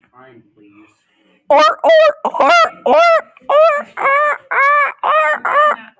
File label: sea lion